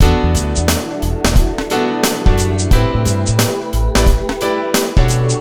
Funk acid

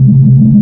room tone; PC Gamer IT CD 41; Daikatana

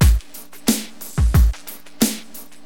DM LOOP, Hip Hop, Drums; LiveAct Pool 1

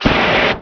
flame; Robotron; PC Gamer 39

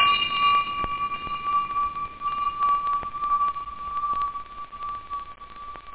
ting; da capo vol1; drums nature

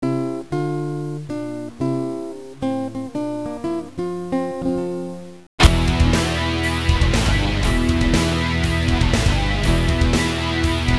music; Premiere 5.0 Tryout

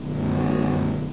pulse

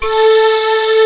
spacevox a4; Hexen Demo; Hexen Music; Hexen 6vox

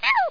oooww; Worms

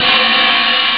hihat, drums; OctaMED SoundStudio V1

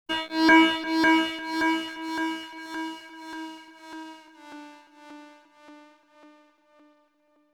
VCS3 FX, Synth

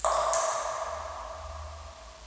DRIP

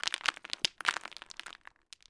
pebble; DAoC; Toa; Gamestar

